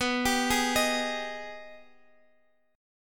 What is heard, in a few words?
Ab Minor Major 7th